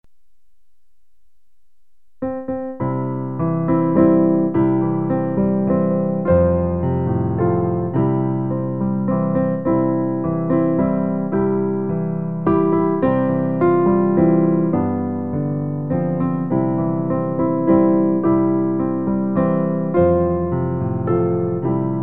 j aime mieux avoir christ piano; recueil Louez Dieu

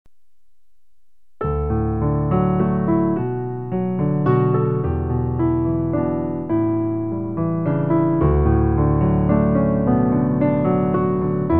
piano

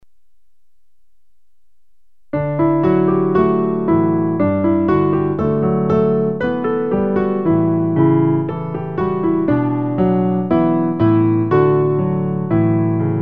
piano

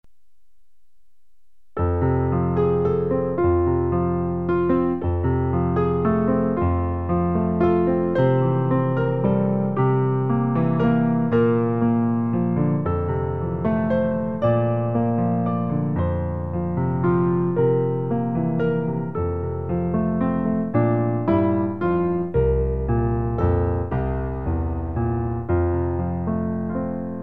piano

